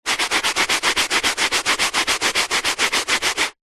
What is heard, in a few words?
brush small scrub rug; household